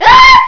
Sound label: Scream; NPC Scream